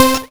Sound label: Lead, 8-Bit; Games And 8-Bit Kit Volume2; atomsplitteraudio; chiptune